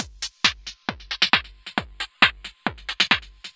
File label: Drums Techno